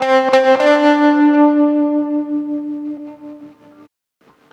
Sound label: FX04, FX guitar; USB Soundscan vol.31; Miro s Grooves; AKAI